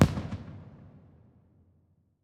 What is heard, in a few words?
Fireworks